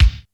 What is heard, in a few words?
BASSDRUM, D110 DRUMS; Crypton - DrumBase5000